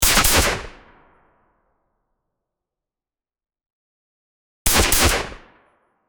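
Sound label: airsoft weapon single shot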